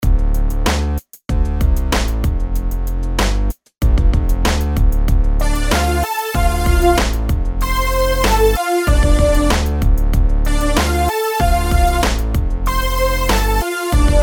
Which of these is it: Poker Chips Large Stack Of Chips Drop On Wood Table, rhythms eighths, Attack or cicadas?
rhythms eighths